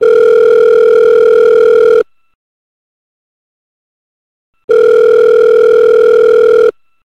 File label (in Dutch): telefonen